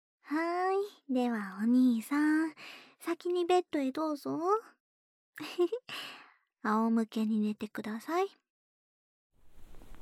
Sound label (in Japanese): ペロペロ舐めていきますねー, ASMR